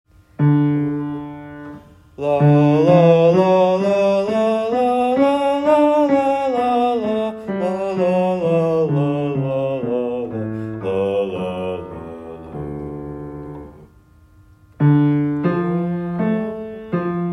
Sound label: Bass; Audition